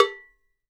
COW MU; Sampleheads; NYC Percussionworks; 90 s Sample CDs

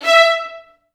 E4, Advanced Orchestra; Best Service

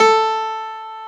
PF A 2, JAZZ

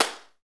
PRC XCLAP, Hand Claps

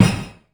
PERCUSSV HIT, DANCE PERCS; Crypton; DrumBase5000

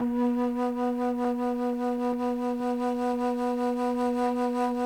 CHAMB FLUTE C3; Sonic Reality; Vintage Timetraveler

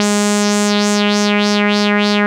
wah wah G#; Analogon

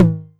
Tom Hi, Novation Drumstation 909

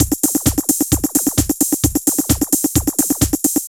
Drum Loops

